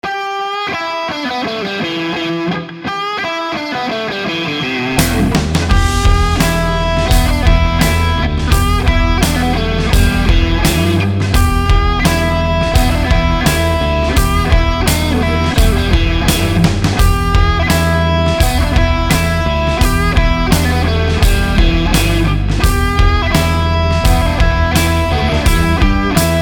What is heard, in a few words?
gritty blues rock